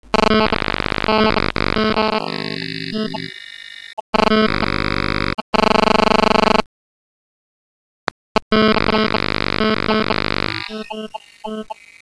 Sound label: error